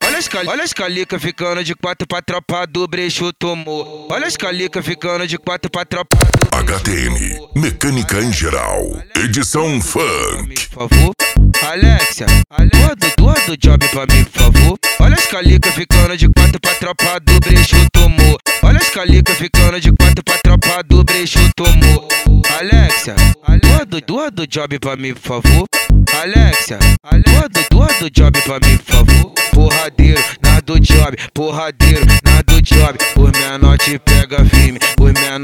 mecanica em geral edicao funk

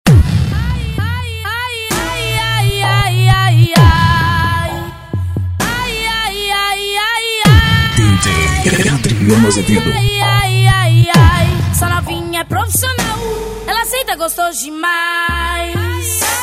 funk bass o poder do grave

